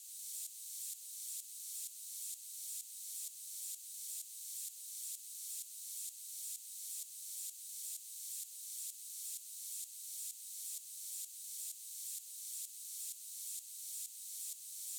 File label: sweeping fx, Key C#, Bpm 128, Big Room House; Loop Nerds; Big Room House Allstarz